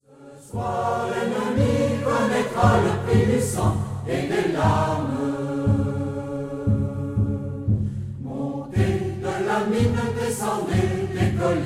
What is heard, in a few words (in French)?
chorale; voix rebelles le chant des partisans